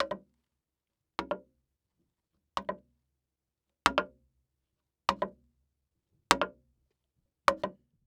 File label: Foley Footstep High Heels Slow Walking On Wood Boardwalk; Foley Footsteps